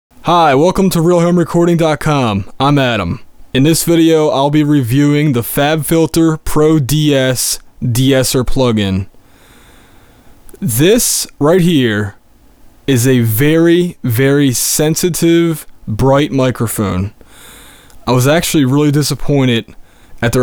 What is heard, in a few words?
fabfilter pro-ds off